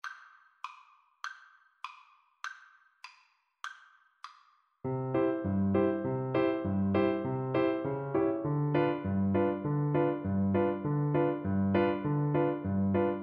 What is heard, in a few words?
polka, Cello